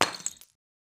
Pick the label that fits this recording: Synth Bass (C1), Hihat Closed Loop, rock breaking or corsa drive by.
rock breaking